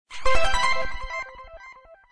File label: btn Standard Win Animation; html5 slots